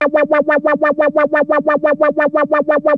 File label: ARP 2600; Hollywood Edge - Giorgio Moroder Rare Synthesizer Collection